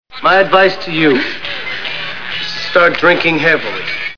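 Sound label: drink heavy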